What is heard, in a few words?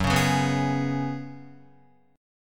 Gb Suspended 2nd Suspended 4th, Guitar, Gbsus2sus4 chord; strummed; 1,4,1,4,1,4